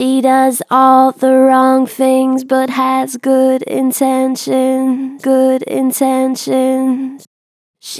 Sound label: Gentle Vibrato "She does all the wrong things", Vocals, Natural Vibrato Gentle; Harmor Vocals